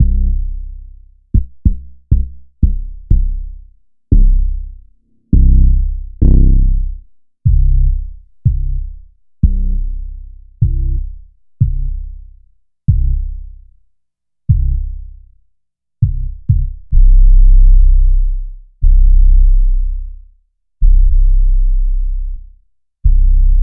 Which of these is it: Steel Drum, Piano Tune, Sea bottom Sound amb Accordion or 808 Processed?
808 Processed